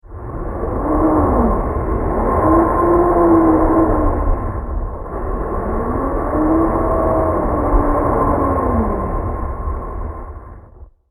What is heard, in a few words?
Monster Trucks On Mars, spacecraft sound effect; Andrew Fleming